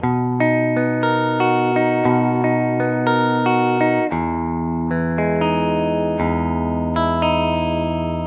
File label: clean calming guitar melody 116bpm B major